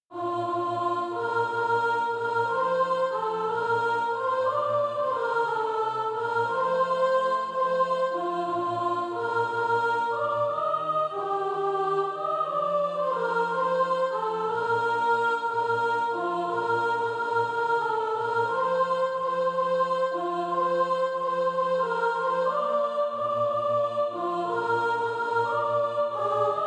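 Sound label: sop, Practise Music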